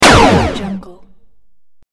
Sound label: laser shot